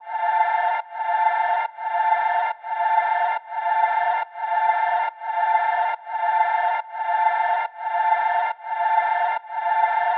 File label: Infinity Vocal Ambience, 140 BPM F Maj; Fairy Dust; Cymatics; Infinity Vocal Collection